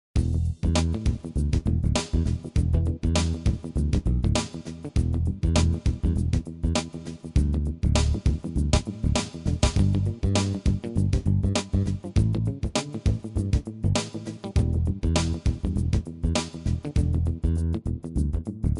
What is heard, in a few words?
Funky; Funky Two